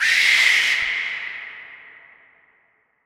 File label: Evil Screech